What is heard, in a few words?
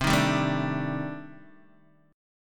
C Minor 6th Add 9th C Guitar Open D